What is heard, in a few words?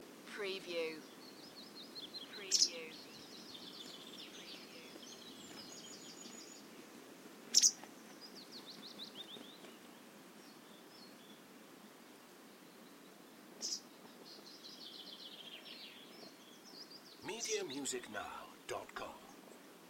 Nature Ambience Bird Flapping and Chirping; beanstalk audio